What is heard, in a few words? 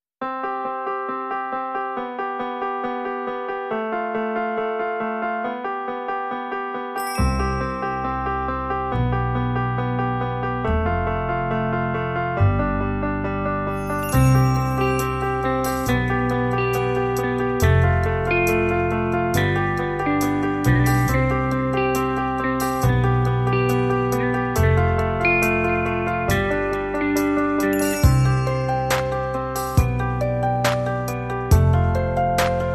No Musicbox